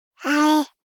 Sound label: BABIES TALK Boy 12 months Iiiii; Baby Boom